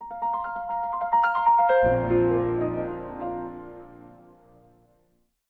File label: Logon Piano; w2k